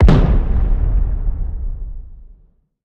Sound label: tank explosion far; War Thunder; tanks